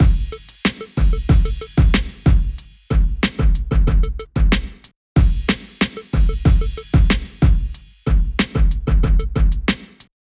Back Alley Rhythm bpm93, Drum Breaks; concrete knock drum breaks vol 1

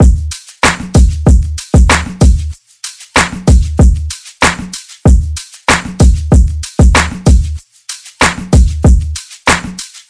Breakbeat Hustle bpm95, Drum Breaks; concrete knock drum breaks vol 1

